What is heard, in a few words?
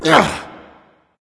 grunt attack; smite; anubis